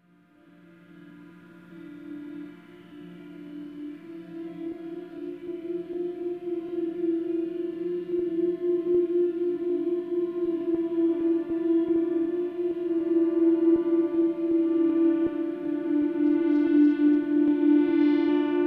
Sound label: ebow; custom alterations